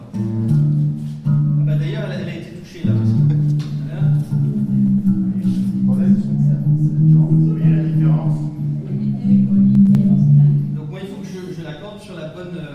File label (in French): Romain accorde sa guitare; guitare acoustique; lutherie; Romain Belliot